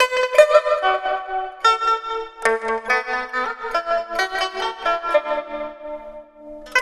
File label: PIPA LP FX; USB Soundscan vol.60; Asian Traditions; AKAI